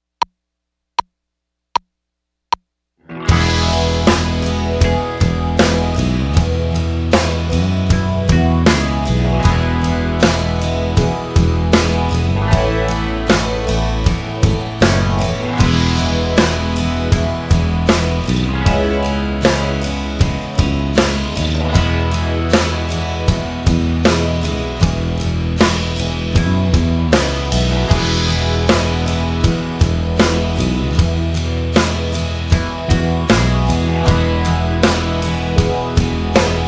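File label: Cascade In E Without Guitar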